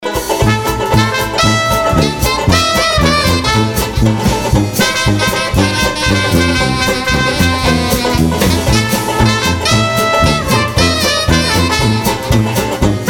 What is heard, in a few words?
swing